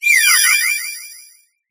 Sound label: hattrem ambient; VidyaPokeCriesCobblemon-V1.2; pokemon